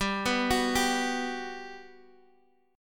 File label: G Major 7th, Guitar, Open G; down